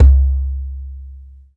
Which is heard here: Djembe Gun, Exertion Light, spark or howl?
Djembe Gun